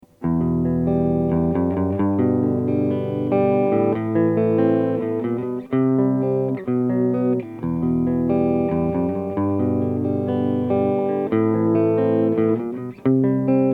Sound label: clean